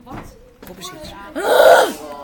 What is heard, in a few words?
ahh